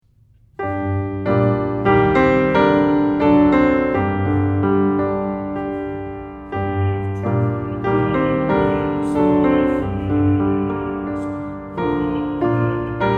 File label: Piano; Give To The Winds Thy Fears; piano accompaniment pieces